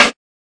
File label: snare